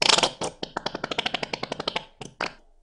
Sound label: pooping; horror